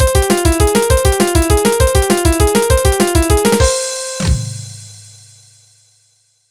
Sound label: tone